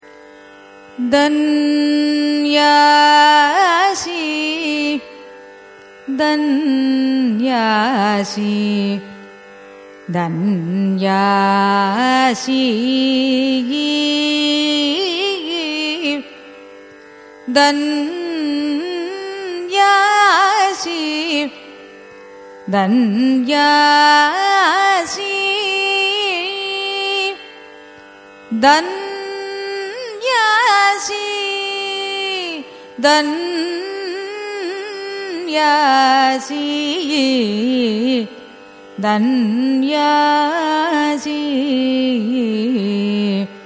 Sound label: raga dhanyasi signature; carnatic music